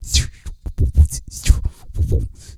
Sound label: ELASTIC TUBA; Blues & Soul